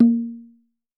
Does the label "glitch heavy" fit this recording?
No